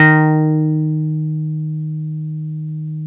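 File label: OB8 piano EB2, OBERHEIM OB8; Big Fish Audio - First Call Vintage Keyboards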